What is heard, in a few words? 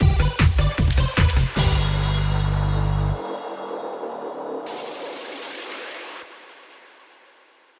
win stinger; Chatter